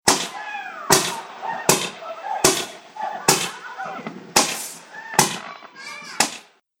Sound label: Fireworks Small Firework Launch With People; Firework Sound Effects; Celebration, Party